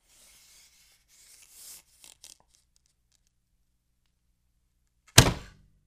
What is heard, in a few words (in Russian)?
natjagivanie tetivy i vystrel